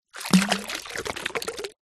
bottle plastic small pull out deep water pour water out into water; zapsplat